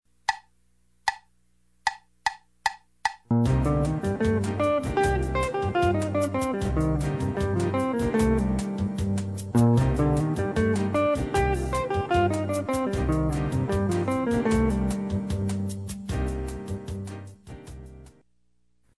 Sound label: Jaz riffs C Dorian